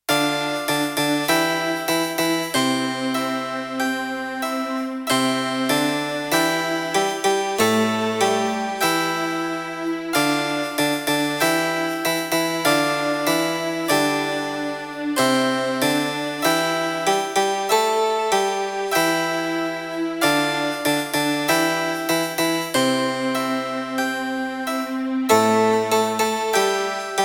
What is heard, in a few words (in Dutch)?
Bas en Viola; Bas en Viola in China